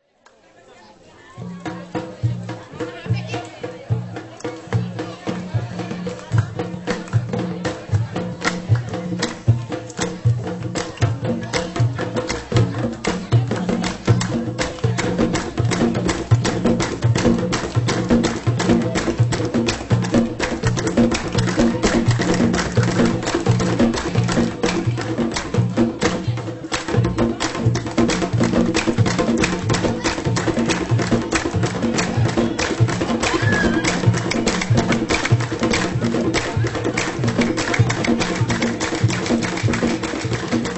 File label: Drums Shell; World Sounds